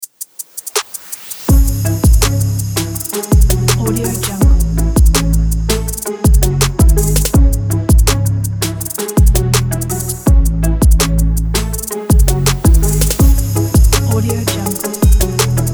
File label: the hip hop